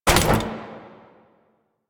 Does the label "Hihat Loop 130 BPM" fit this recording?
No